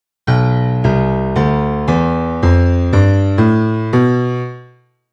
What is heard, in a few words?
B Locrian mode; Locrian scale starting on B